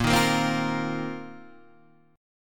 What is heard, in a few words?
A sharp Suspended 2nd Suspended 4th down